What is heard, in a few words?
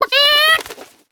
Attack